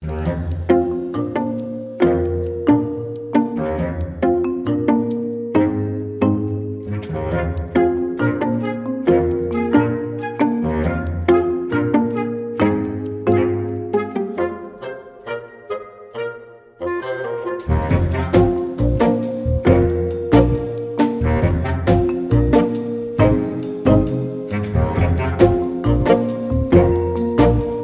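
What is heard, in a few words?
Waiting, musik für telefonansagen; musik fuer telefonansagen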